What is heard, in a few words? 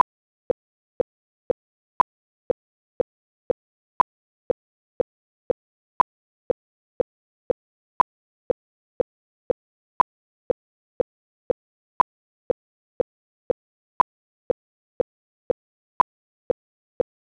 clicky; rsi